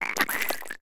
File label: Tiny Creature Undead, Monster Sounds; TheDeclineOfWarriors; Monster Sounds Volume II